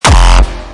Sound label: hardcore kick